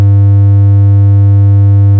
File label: triangle 100 stereo